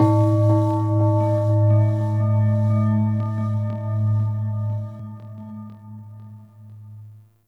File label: Temple Low Bells; Ambience; Corpus Ship; Temple One